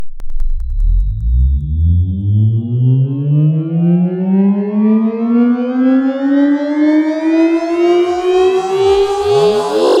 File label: Space Ship